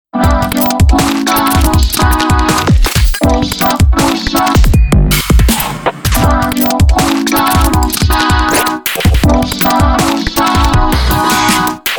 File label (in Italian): jingle spot hyperpop breve